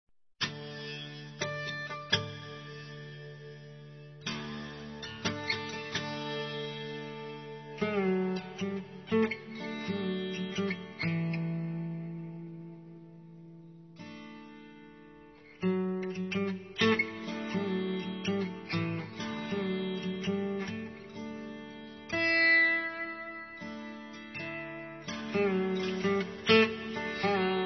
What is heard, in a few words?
Fusion Music Raga; RKC Live Stream; Travel India